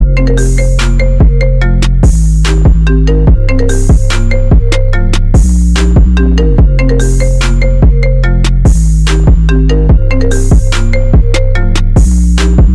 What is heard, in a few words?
iphone trap remix